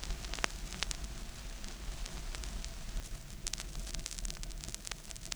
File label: Crackle, Vinyl Noise